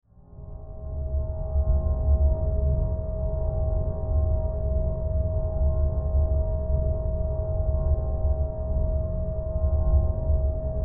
Sci Fi Robots Robot Giant Resonance Crystal Powered